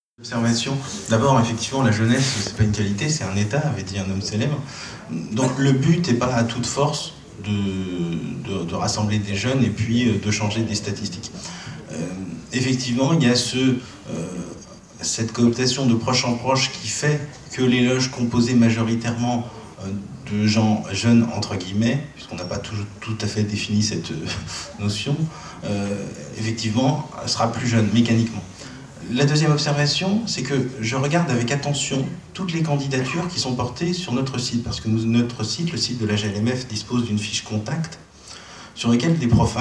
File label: Vocal